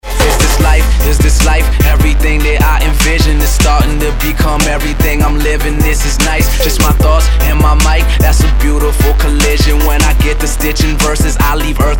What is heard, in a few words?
Hip Hop After